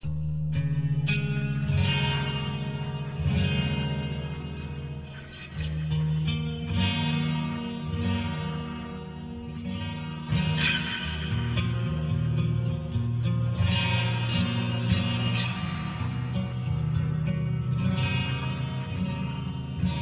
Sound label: folk